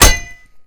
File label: pickaxe hit world; garrysmod